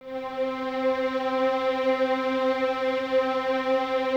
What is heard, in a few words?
VNS C 4, Violins, String Ensembles; Miroslav Vitous